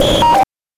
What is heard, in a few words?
BANK2 SFX, NES DPCM; MIDINES